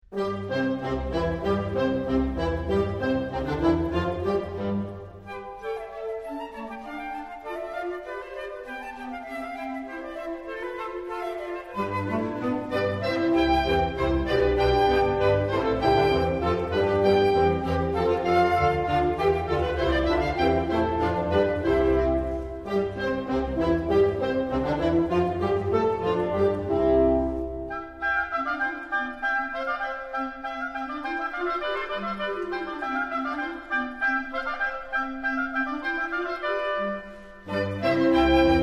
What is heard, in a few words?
Concerto for 2 Oboes Allegro, blasorchester